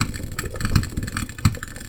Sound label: boiling water; tea time; tea time linear